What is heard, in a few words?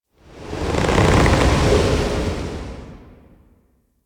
Tower Trunk Movement; Warframe; Quests; TheNewWar; Ambience; Cetus; Unum Tower